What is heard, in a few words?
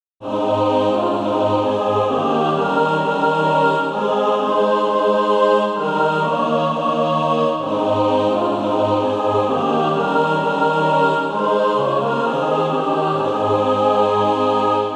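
mixed, SATB Practise Music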